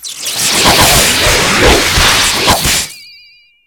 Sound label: judge whip; CosmicRage